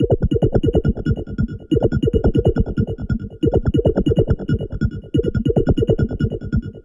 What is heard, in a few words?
synth; widdagroovebox